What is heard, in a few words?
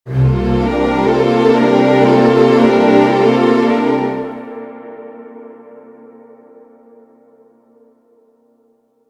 Violin Instrumental Fade Out